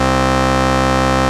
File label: Basses C; Vengeance Samples